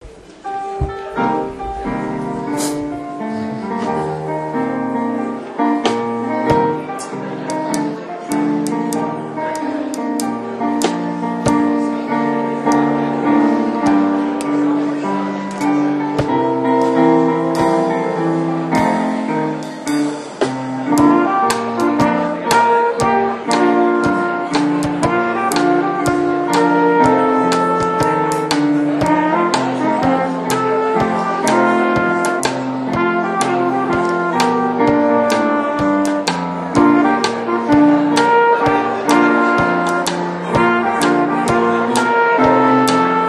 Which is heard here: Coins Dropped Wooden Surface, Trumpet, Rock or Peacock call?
Trumpet